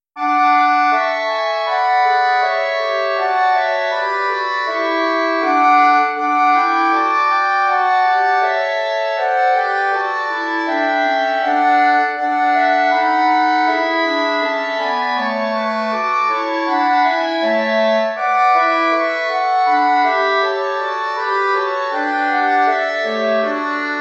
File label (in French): choeur; bach johann sebastian; christ est chantons